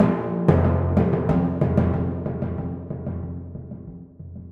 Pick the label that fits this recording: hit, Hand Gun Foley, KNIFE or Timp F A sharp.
Timp F A sharp